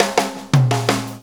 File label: No Small Feat Fill, Drum Tools; Sonic Foundry (Sony Creative Software); Loops & Samples Drum Tools